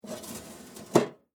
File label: Mail Box Close, Household, Mail, Box, Close, Metal; Miscellaneous Household; BlastWave